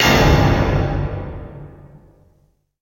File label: Piano Stinger Rever